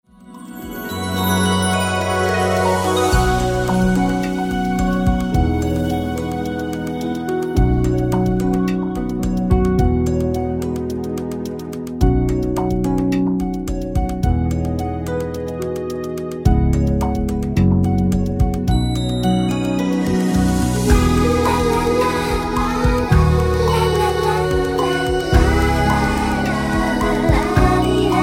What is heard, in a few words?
Karaoke